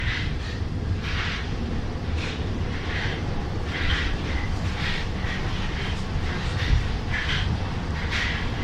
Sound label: acceleration, Metro